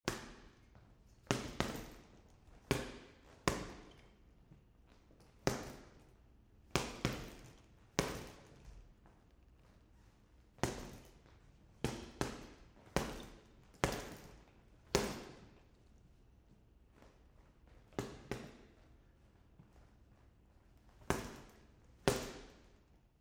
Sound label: BOXING GYMNASIUM